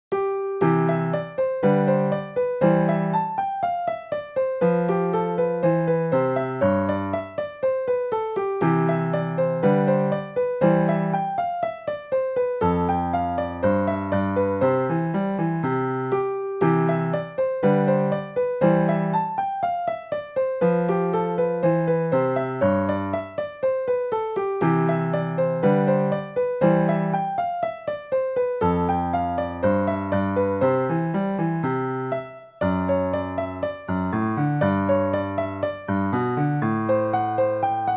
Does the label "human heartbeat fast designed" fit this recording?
No